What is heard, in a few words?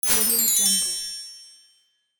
magic slide